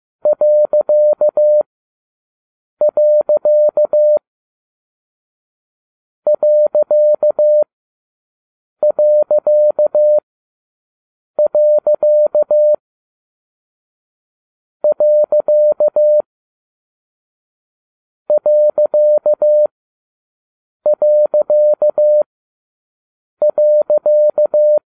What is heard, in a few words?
fullstop, cw